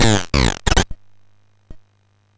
Aurum, MOS6581R4

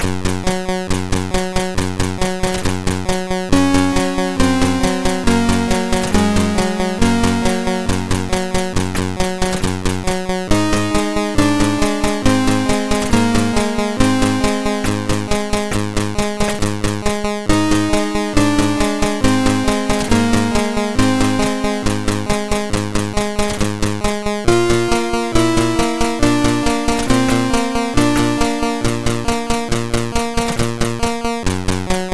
Unknown Sound, MOS6581R4; sid

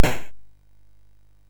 sid MOS6581R3; Gremlins 2-The New Batch

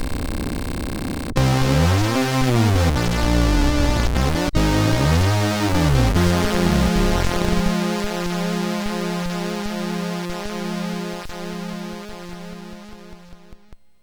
MOS6581R3; sid

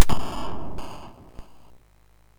sid MOS 6581R2

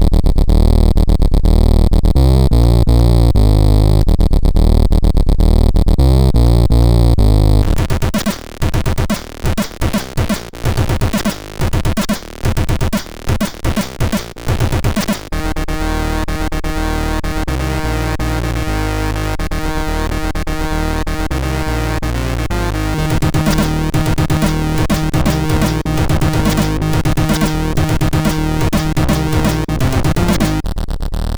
sid MOS6581R3

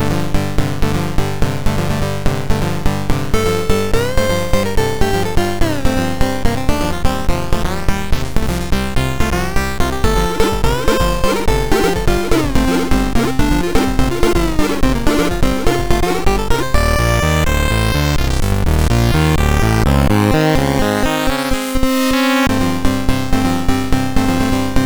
sid MOS6581R3

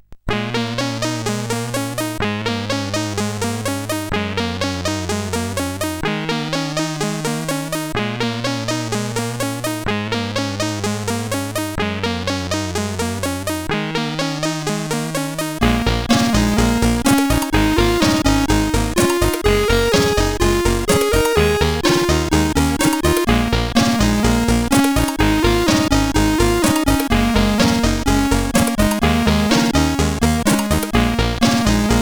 sid CSG8580R5; Tiger Claw

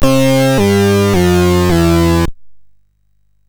sid MOS6581R2; Fiendish Freddys Big Top O Fun

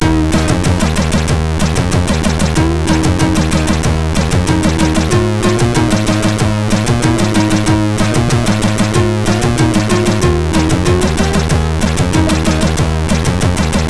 Funmuzax, MOS6581R4; sid

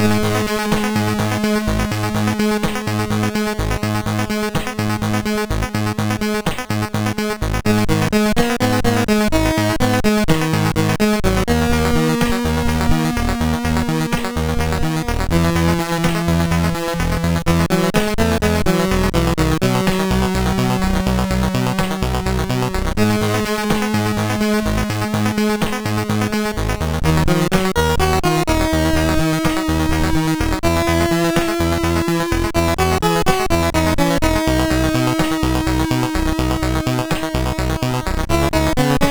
Attack One, MOS6581R2; sid

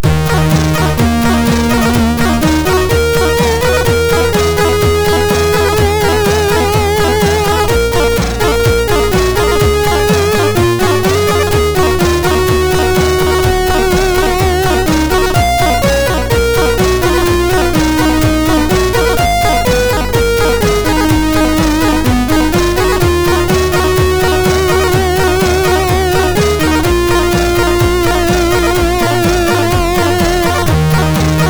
sid MOS6581R2

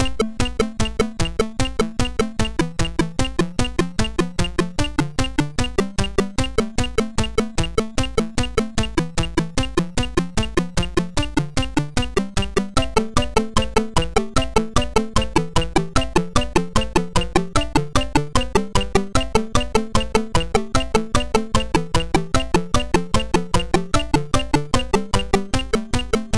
sid MOS6581R2

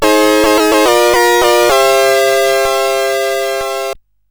sid CSG8580R5; Fiendish Freddys Big Top O Fun

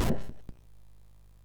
sid MOS 6581R2

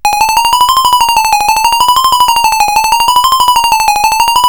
Mini Arcade Climax, CSG8580R5; sid

